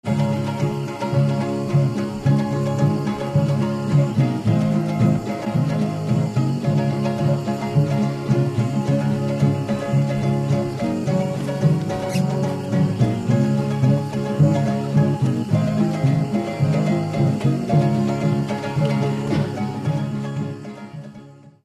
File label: string